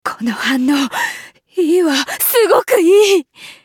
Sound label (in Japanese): ケミカルエレクトリックショック; ベロニフ